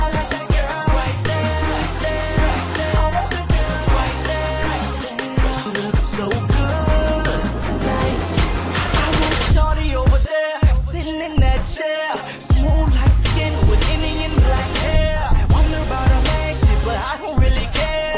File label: Rocknroll